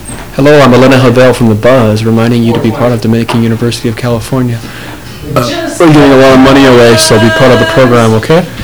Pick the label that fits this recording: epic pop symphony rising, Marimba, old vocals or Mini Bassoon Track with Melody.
old vocals